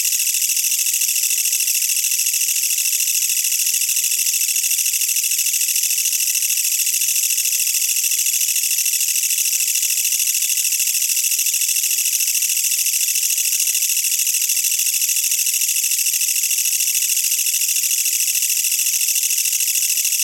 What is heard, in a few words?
kutsuwamushi, songs of insects